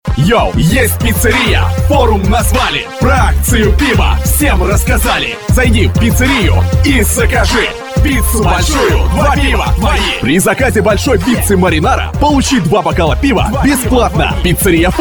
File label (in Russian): vokal